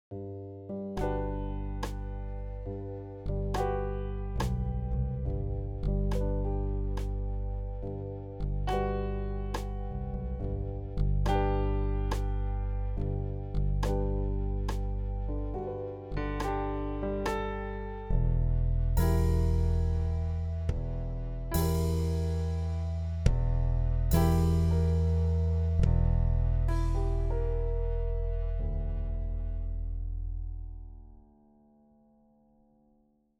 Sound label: wizard piano